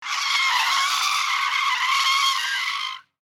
car sudden braking

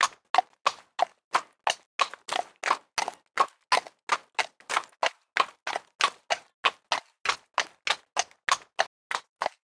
horse walk

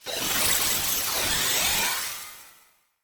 magic drain

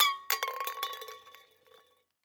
can kick

